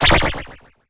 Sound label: wrong